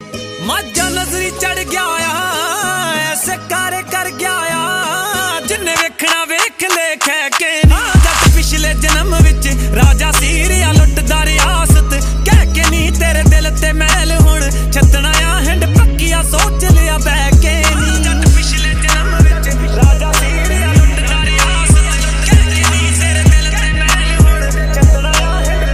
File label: Punjabi Beats Ringtone